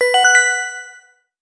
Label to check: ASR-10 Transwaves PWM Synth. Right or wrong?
Wrong